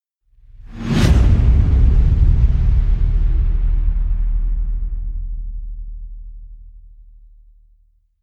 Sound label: booms shatter with whoosh